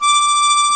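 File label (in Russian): губная гармоника нота высокая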